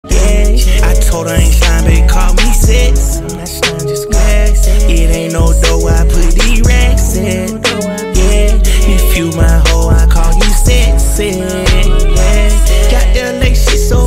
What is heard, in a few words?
Trance